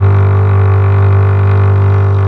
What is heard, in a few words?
mello cello